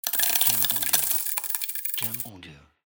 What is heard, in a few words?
Falling Money